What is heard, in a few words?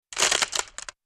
impact crunch; BRYTLJUD